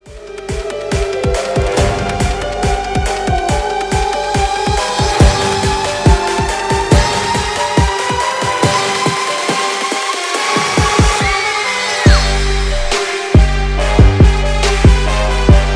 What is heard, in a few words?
halloween theme song trap remix